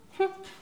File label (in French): hum